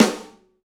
Pop Snare; Snares

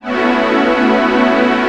padlike; E-MU Formula 4000 Series Vol. 1; Hip Hop Nation

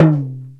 ROCK PERC.

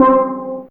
Steel C3, Fairlight CMI IIe, Mallets

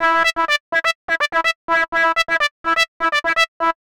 125 Fluty Blipps E, Keys, Nu Jazz House; PowerFX - Nu Jazz House

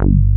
RESO PLG BS, BASSES; Pro-Rec - Synthsonic

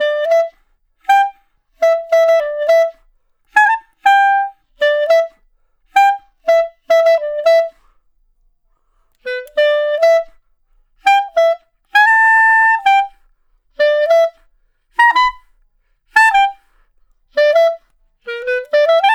Clarinet Solo; Sonic Foundry (Sony Creative Software); Jazz Solos and Sections

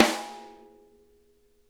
SNARE rim; Sonic Reality; Interactive Drum Kits

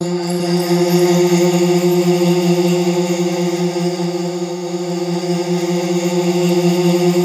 PADS

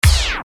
Bass Dr, EuroDance Bdrums

